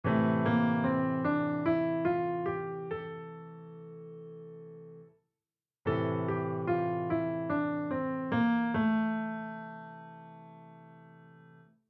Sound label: phrygian